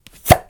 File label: Arrowstorm